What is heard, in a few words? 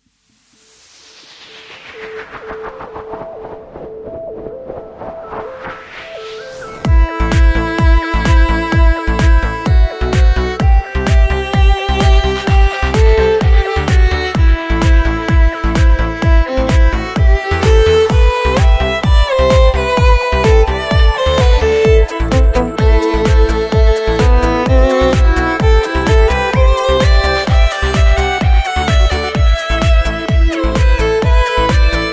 Minimal Beat